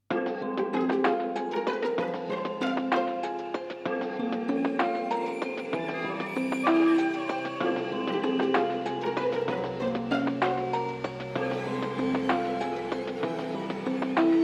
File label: Horror forest; atelier lydie suelle the alchemists and the mysterious paintings dx switch gamerip 2021